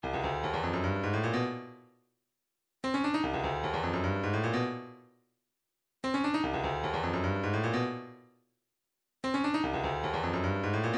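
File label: 150 percussion loop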